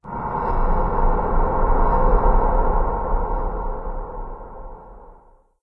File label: amb random abstract; video games; ambient; source engine; portal2